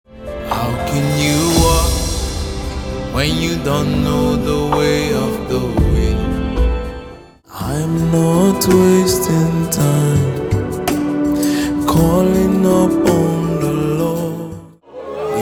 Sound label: Prayer Chant; Spirit Of Prophecy